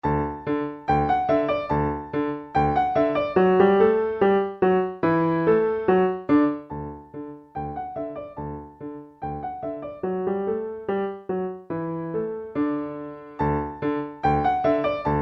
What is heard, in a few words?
Bach Musette Re Major; ilk bach albumu